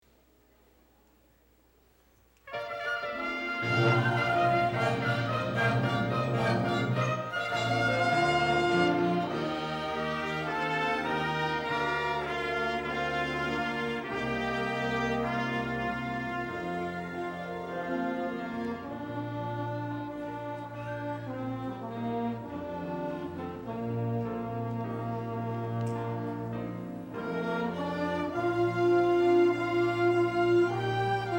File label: orchestra playing easter morning